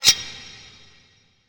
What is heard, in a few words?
box glow start; dark king